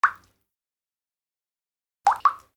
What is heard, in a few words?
Water Drop